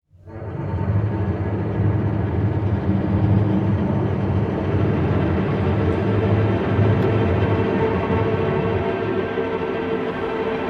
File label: Horror Effect Crash Reverse With Violin Chamber